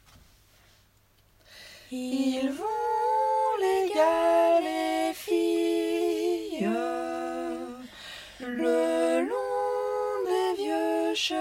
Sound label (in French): les moissons deux voix